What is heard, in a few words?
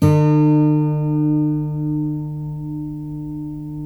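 12-string guitar; GUILD 12-STR; Spectrasonics; Hans Zimmer Guitars VOL-1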